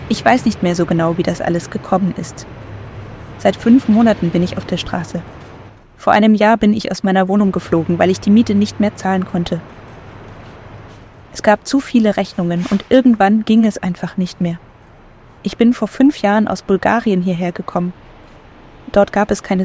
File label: street